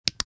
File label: Electronic military device button press; Military Equipment